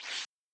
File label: Rip Up Paper